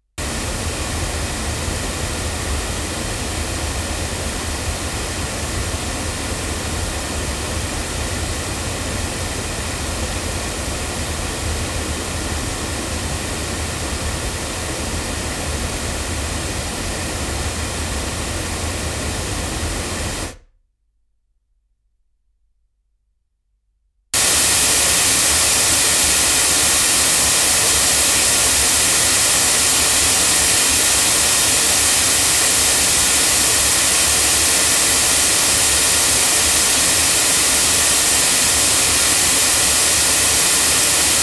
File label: MT87S loudspeakers; TSM